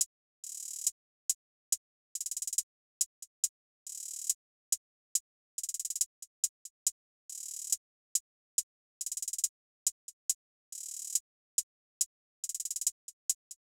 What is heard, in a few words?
Drum Loop, 70 BPM Hihat; Cymatics; Vibes II Drum Loop Collection; Dialed Drum Loop